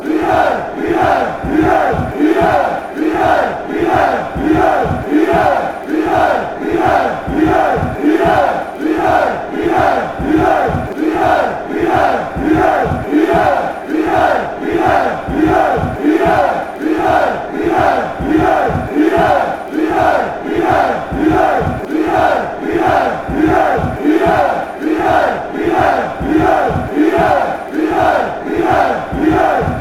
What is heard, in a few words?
inside fan chants